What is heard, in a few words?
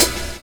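SAX N GUITAR; Univers Sons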